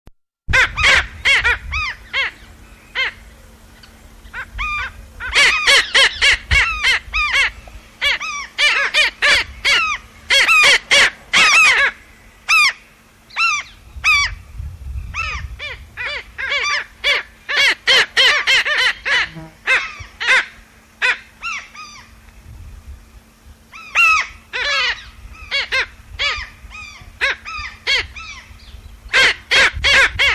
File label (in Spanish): rayador, Rynchops niger, Black Skimmer; cantos; Rynchopidae